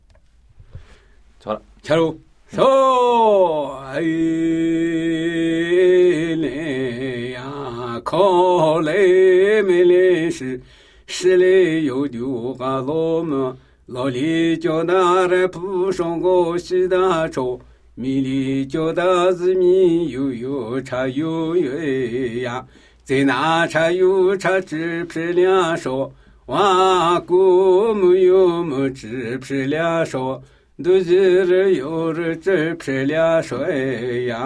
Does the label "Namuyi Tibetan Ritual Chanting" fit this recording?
Yes